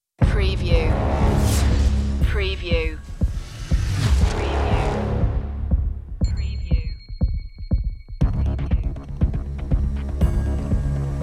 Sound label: no choir